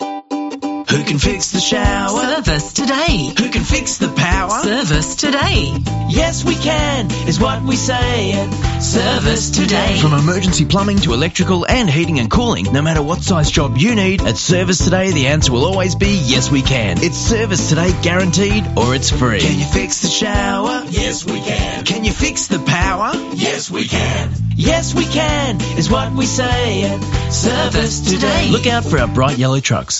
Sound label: Jingle